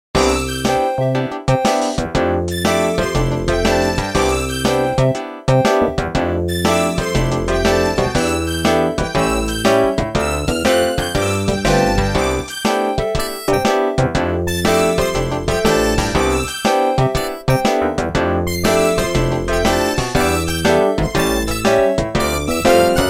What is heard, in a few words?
Yamaha SMAF demo ringtones; Business Bounce